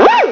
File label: WHOOOH !